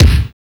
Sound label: base drum; DRUM LIBRARY; DRUMS